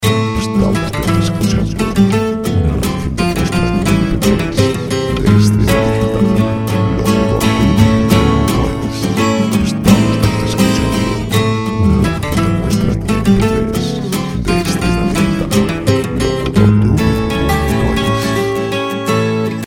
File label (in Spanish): 85A pop acustico; music chillout